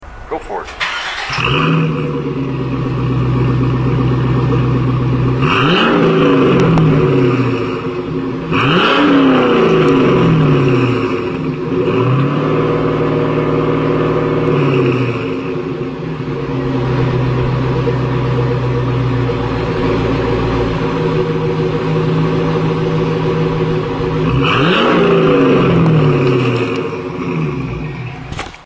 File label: TransAm dual, custom exhaust systems